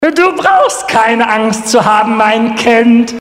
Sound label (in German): Jingels